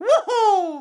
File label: Jump; NSMBW; Mario Yoshi